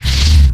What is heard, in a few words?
hurt